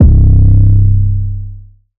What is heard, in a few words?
Long Sizzle 808 - C; Drum Samples; Cymatics; PHARAOH